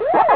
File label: pac chomp; pacman